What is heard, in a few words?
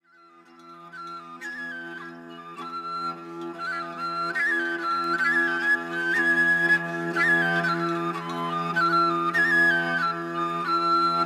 crwth & pipe